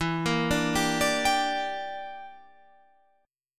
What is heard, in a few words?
E Minor 7th Flat 5th, Guitar, Open F; arpeggiated; Em7b5; 16,17,14,14,17,14